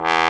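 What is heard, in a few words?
Trombone, Orchestra Brass, Roland; Club-50 - Foundations Roland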